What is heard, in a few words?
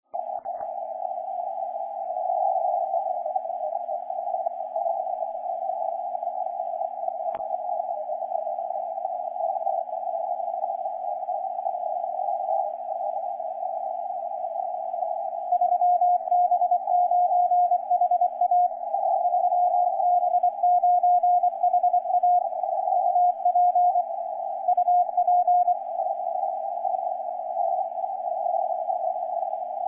CW; 3B9HA